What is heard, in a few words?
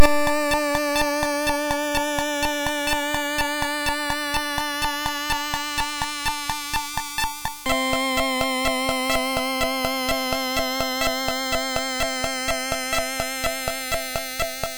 Sample Music, MOS6581R3; hvsc